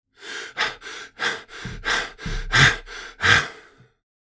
Old man rapid breathing

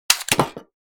Bolt rifle empty mag drop; assault rifle

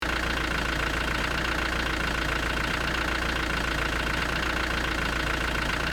Old car engine idling seamlessly looping

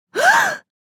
Female shocked gasp

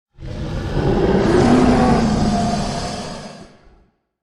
Dragon aggressive roar attack

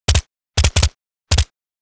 submachine gun two three round bursts; Engineer

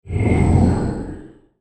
Fantasy rpg game menu ui clear

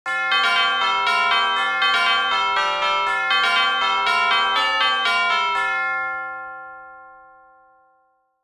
Church bells ringing chimes wedding; large bell

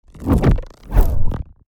Energy particles whoosh

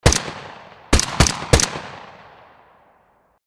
AK-47 assault rifle multiple shots; gfxsounds

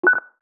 Cash register checkout single button press

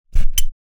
High pressure handgrip sprayer single press; hand tool

